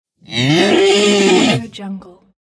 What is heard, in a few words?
angry bull; prof-s